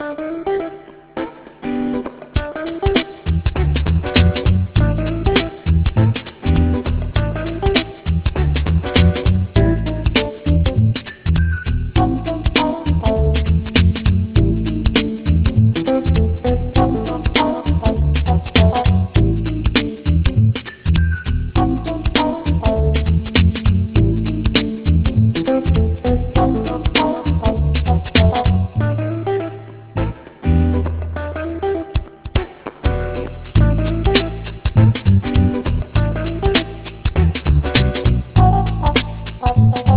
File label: phrygien; jazz; groove